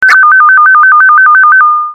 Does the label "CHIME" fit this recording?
Yes